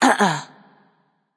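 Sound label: disagree, female; smite; voicepack; hera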